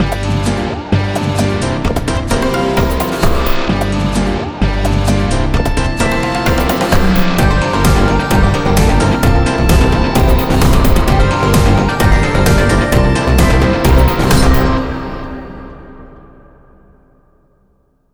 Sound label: Step